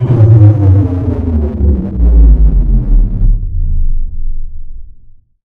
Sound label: Gravity Off